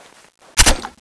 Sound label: clip in; tfa cso; monkey wp set2